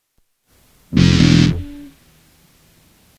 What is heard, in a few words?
Asteroid; Asteroids